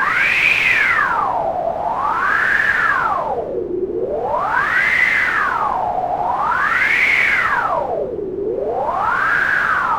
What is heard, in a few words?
wind; lange-nacht-2009